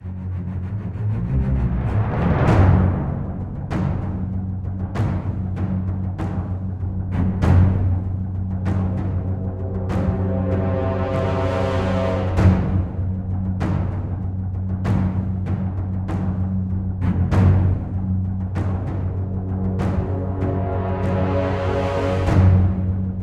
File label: Raid Lobby; preta vendetta rising ps4 windows gamerip 2017